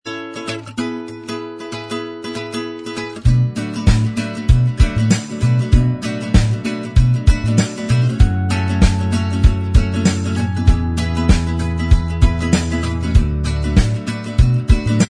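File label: instrumental track, backing tracks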